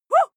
female shout surprised short; schreie; menschen

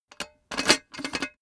cash box metal unlock; soundpool

